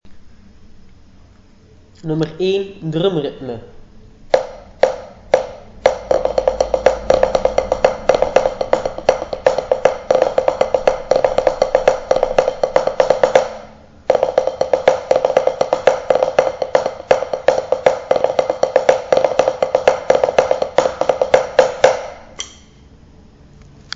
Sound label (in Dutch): drum ritme